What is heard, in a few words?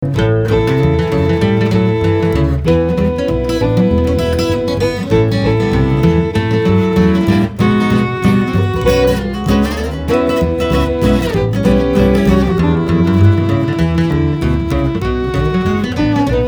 violin guitar & bass